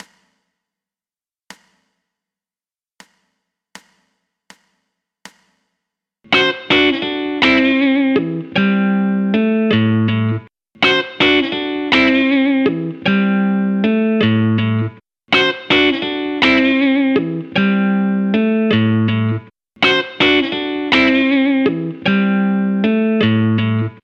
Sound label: Blues Riff A 80 BPM